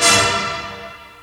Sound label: ORCHESTRA HIT; Crypton - DrumBase5000